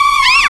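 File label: synth effect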